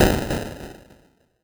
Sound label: mage death; Incantation